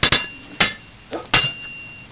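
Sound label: SWRDDUEL, sword duel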